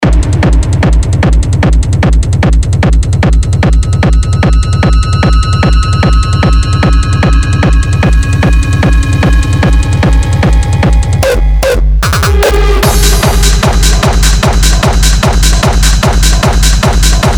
industrial techno; nutty traxx; industrial techno vol 1

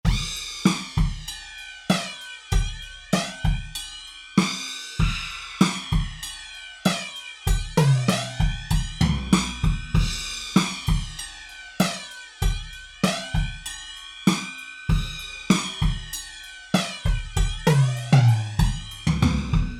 slow drums, flanger; lii flanger; audyo engineering

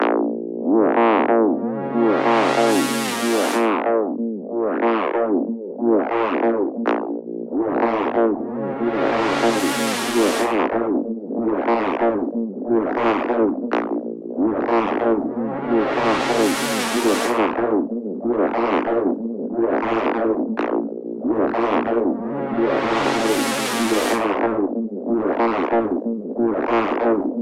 wobble 100 wet synth; 23dsp

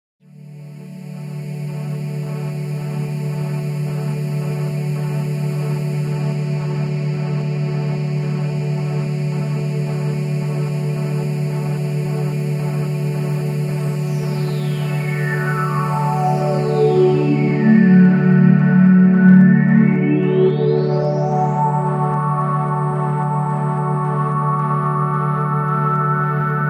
Big Pads Combo; Shortcircuit

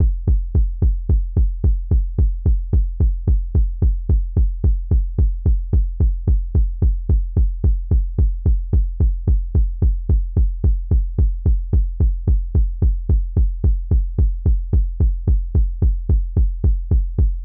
kick solo; deepperfection; brucejames

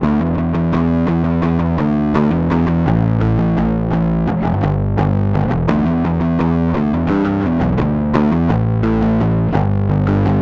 amp squeezer bass; romano; clack digital

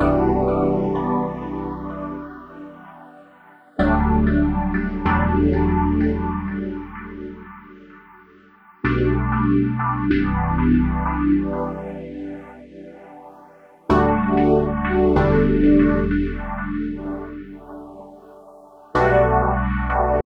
synthwave chords; wavefield

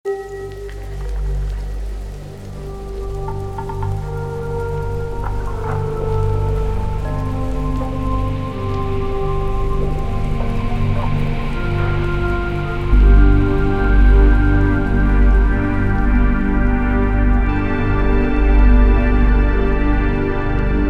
textures; echo system; golden screw studio

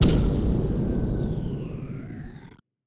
gun shot synth pad lead bang; nova sound

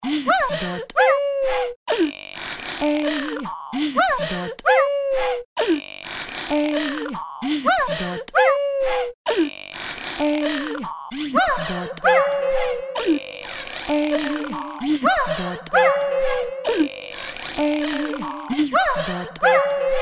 Tuna vox; Zvon

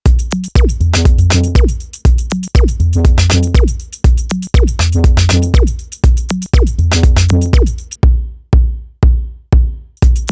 clonk unit, acoustic drum machine; ac-dr acoustic drum machine; soniccouture

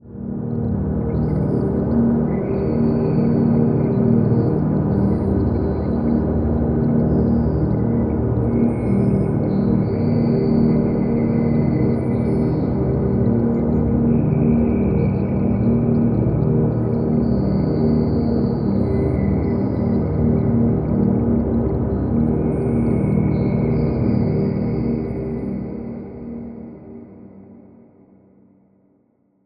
singing drone; wonder; zero-g sample libraries

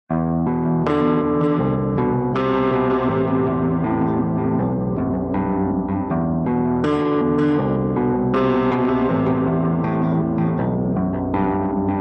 guitar processed

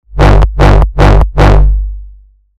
reversed bass; microtonic

